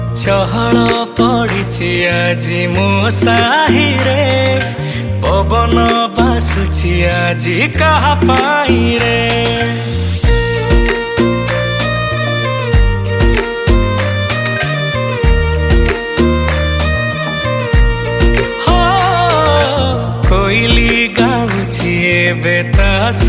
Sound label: New odia song